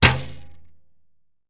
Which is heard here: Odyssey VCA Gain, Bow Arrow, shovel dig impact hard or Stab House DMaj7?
Bow Arrow